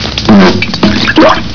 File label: fart; Single short fart followed by water splash.